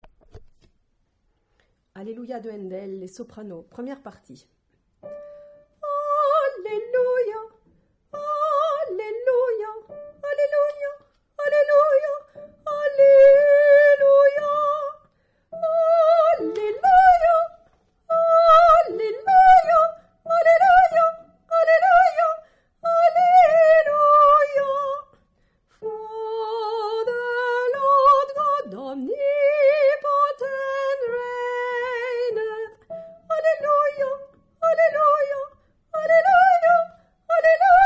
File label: Soprano